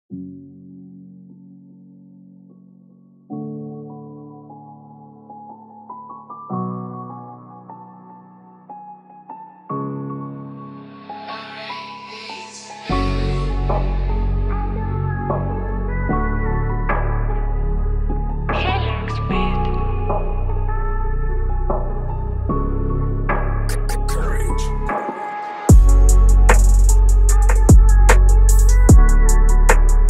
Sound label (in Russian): лирический рэп минус бит для рэпа trap rap